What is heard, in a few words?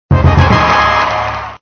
open; 音效